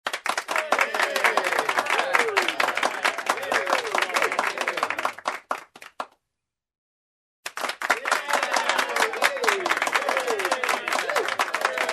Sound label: Ovation in a small room; standing ovation